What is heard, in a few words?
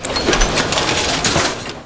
keypad Long; eggomatic